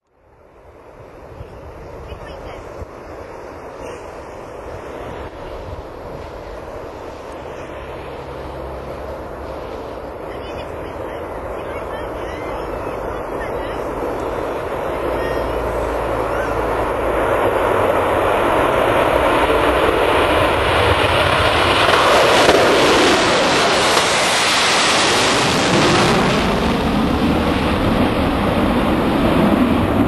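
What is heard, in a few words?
concorde take off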